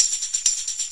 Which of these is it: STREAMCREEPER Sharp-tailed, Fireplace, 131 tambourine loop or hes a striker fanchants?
131 tambourine loop